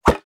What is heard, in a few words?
flippers, pinball; Mummy